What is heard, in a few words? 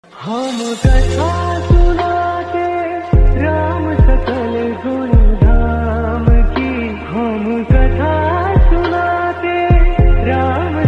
lofi mix bhajan lofi mix relax chill study music slowed reverb; ram